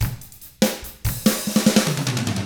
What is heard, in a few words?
rock 6/8, drums; ILIO; Double Platinum Drums 1